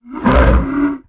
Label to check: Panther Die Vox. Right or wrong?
Right